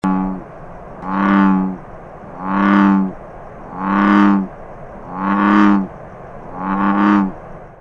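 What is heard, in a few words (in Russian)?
sound effect of a bullfrog in summer; Xiao He Tong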